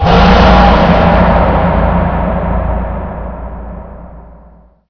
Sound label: ping patrol, combine gunship; hl2